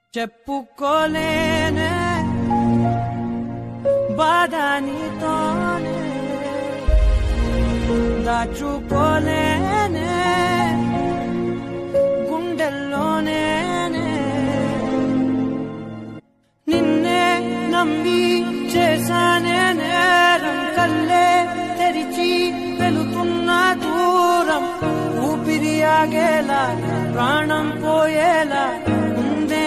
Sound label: Telugu Song Ringtone; ringtones